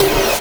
Sweep Snare; Sonic Foundry (Sony Creative Software); Esoterik Beatz; Sweep Kit

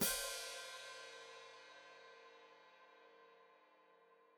Hat Closed Open, Hi-Hat; Deeply Sampled Drums; Cymbals Stereo

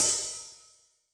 Open, HiHat; Yamaha DTXpress-II

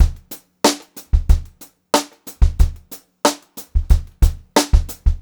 BEAT, Brushed Drum Loops; USB Soundscan vol.39; AKAI; 90 s Sample CDs

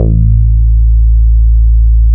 Pro 1 BASS A1, Vintage Keyboards; Big Fish Audio; First Call

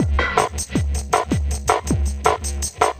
Noise Hop, Beats; Sonic Foundry (Sony Creative Software); Synchro Funk

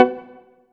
Chord; ModelCycles

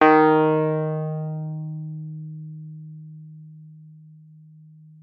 WUFF D#3, WURLITZER; Xsample VOL-1

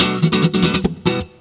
GUIT GROOVE; Best Service; World Colours

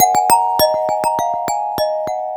Fast Glass; Ambient; Melodic; Ambient-Melodics

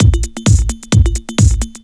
drum 130 groove; Techno Trance Essentials

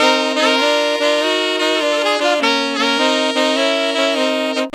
Sax Section in Bb min, Jazz; Sonic Foundry (Sony Creative Software); Jazz Solos and Sections

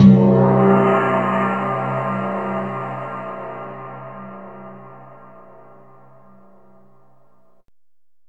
synth gong; Zero-G Ambient 1

